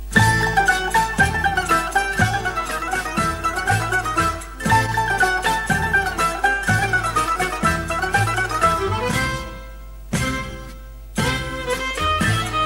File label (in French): Zeybetiko - Grece